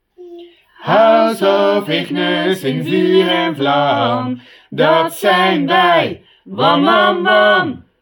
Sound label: Yell; Oranje Ignis